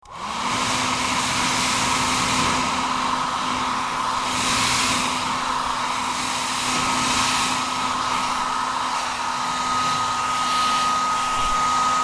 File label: hair dryer